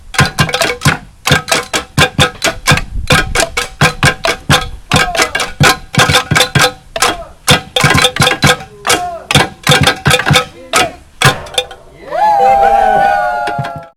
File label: roof tile; autonomy lab; lumbung commons; humdrum press